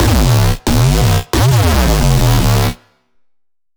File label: Blank neuro, bass